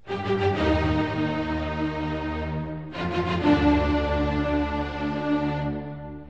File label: Beethovens 5th Symphony